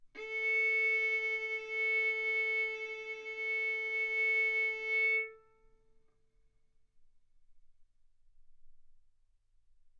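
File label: Violin arco mf A string A4 B4 stereo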